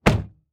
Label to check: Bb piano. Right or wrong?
Wrong